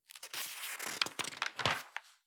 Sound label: Book, Paper, Page Turn; Books Alive